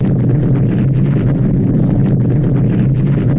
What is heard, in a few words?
Rumble Sound